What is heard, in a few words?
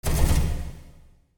warship shot; OpenFrontIO